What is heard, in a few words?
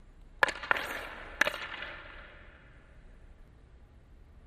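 Hockey Medium Impact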